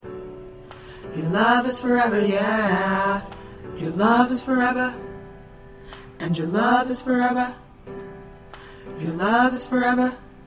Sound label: Tenor, choir